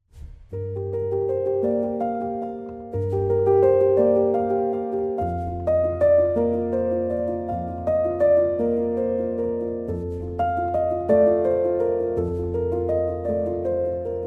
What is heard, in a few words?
Piano